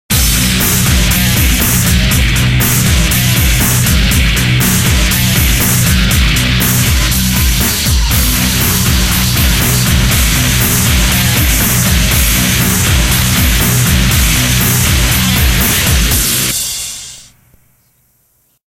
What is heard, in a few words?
Jingle winner; XBOX; Gotcha; Jingles